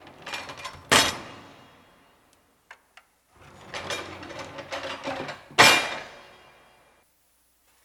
Doors Jail Open Close; Home & Office; 1001 Sound Effects

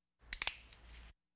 Hospital effects; Valentino Production Sound Effects Library CD50

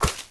Street Ball Hit Hay; SFX Kit

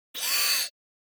Servo Movement Small Fast; Hollywood Edge; sound designer's toolkit v2

